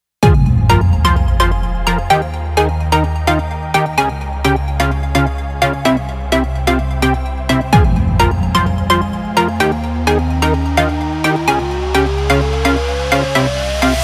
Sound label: EDM instrumental